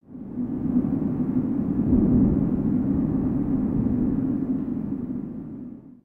Wind Cave One Shot; ambience; Lego; ninjago

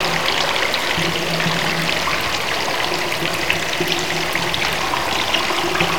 water swift running loop; ninjago

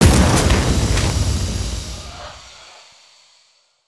Serpent Smashable; destructibles

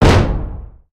Troll Drum Short

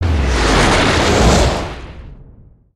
Lightning Tornado; Spinjitsu

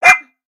Pet Dog Robotic Happy; creatures; pets; Lego

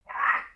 Pets Skunk Idle Short; creatures; Lego

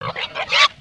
Pet Warthog Idle

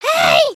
wave, emotes

